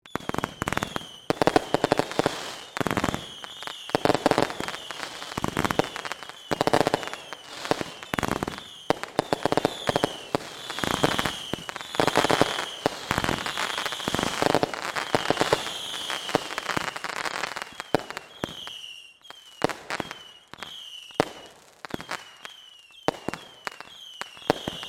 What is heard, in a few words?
Firework Whistles, Cracks, Pops; Fireworks; BlastWave